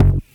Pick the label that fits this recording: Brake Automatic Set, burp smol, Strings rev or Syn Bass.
Syn Bass